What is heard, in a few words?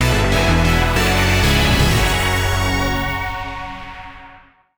Medium win